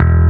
bass

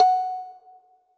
Kalimba F#4

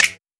clap D

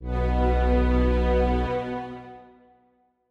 Strings

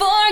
vocal

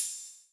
Hats Open

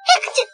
combo break; osu

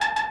synth; osu

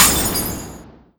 breaking thunder; osu

